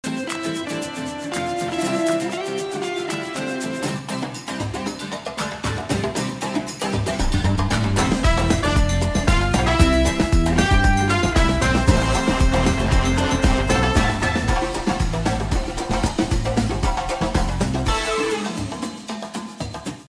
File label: BGM; Fruit King; CQ9